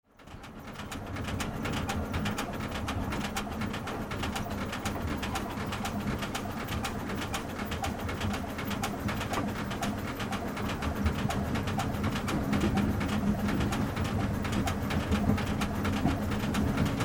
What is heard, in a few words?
old train cabin inside stopping